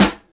raw steel snare drum sample g sharp key

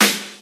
dry acoustic snare g sharp key

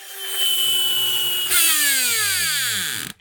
toy spring motor airplane stop

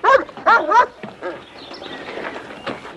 barking closely cheesed off; animals

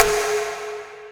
trap snare g sharp key; snare drum samples

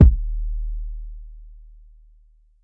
big room kickdrum sample d sharp key

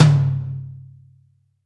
high tom single hit c key

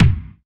bass drum f key; drum single hits one shots; kick drum samples

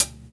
smooth foot hi hat sound g key; tuned drums

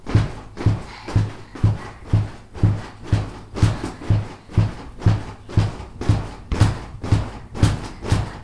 kid jumping on a spring mattress

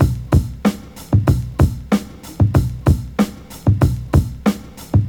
95 bpm drum groove b key; drum loops

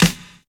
snare E key; snare drum samples; drum single hits one shots

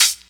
short foot hi hat sound A sharp; Duration 0:01

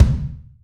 subtle reverb kick drum sample c sharp key

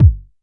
puffy kick one shot d sharp key; kick drum

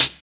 soft foot cymbal d key

